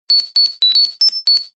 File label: Message Tones; power ranger